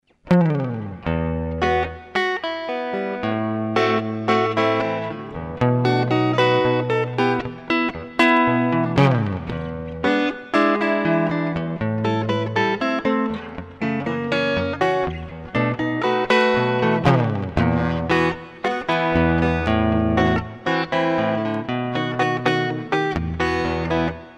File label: telecaster MCat Neck B